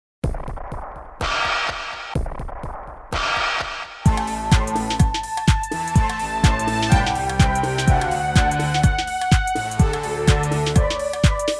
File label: this is my house karaoke